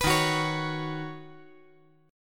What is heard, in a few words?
D sharp Minor 7th Sharp 5th D sharp, down; D#m7#5 strummed; 5G,4C,5E,5A